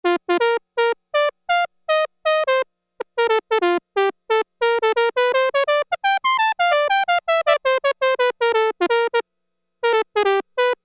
Trumpet, Roland Juno-60; Factory presets

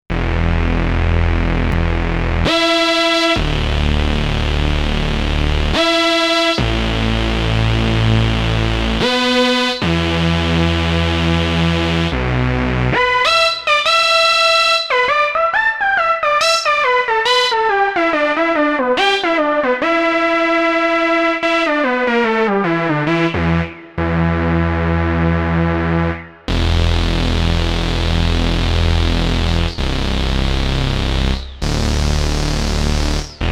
Dyno Hook

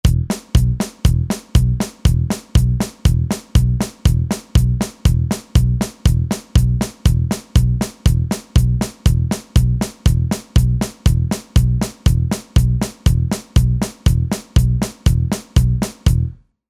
Country

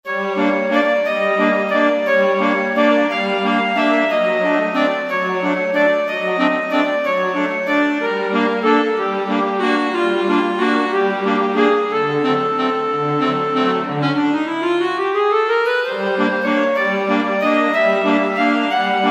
Bumper Orch

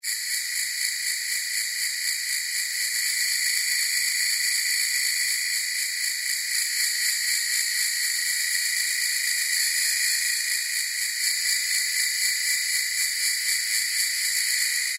Yamaha TG500; Internal Voice; SE Crck

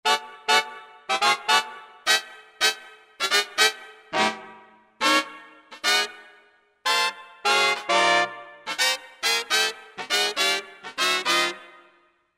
Brass Chords; World; Latin; Roland SR-JV80-18 World Collection Latin

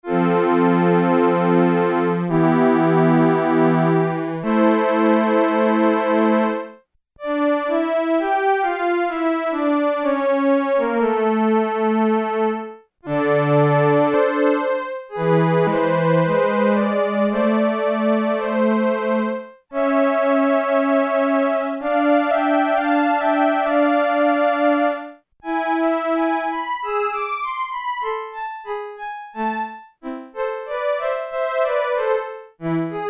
Strings, Korg Poly-800

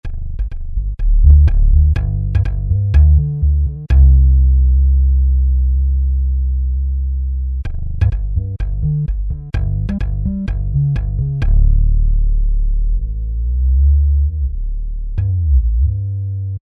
Bass Desire, Roland V-Synth; Factory Patches

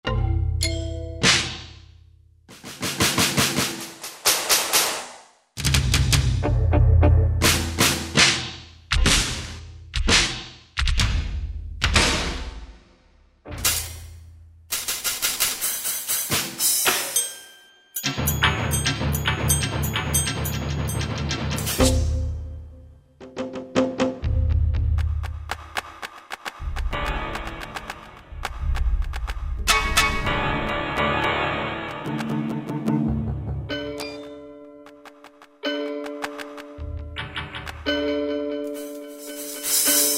DR Efect, Yamaha TG500

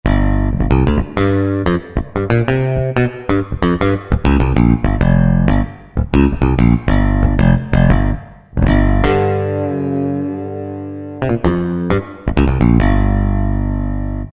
BA Round, Yamaha TG77; Factory Presets

